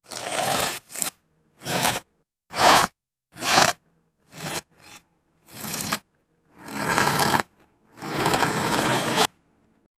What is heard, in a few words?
a chiptune sound effect o